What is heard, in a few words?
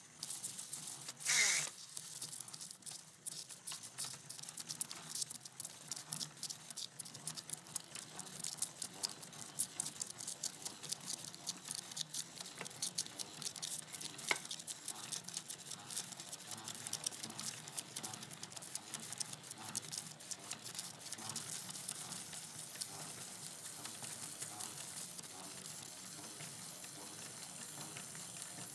Camera Reel 35mm; SFX Producer